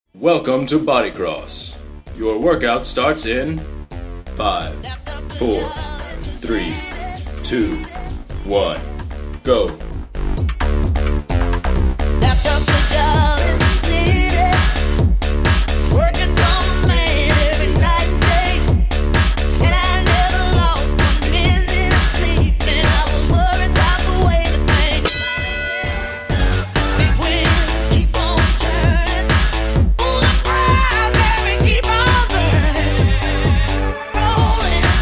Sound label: techno probe